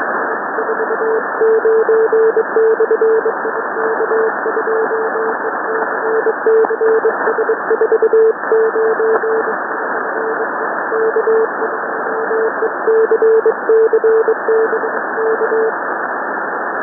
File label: sending random prefixes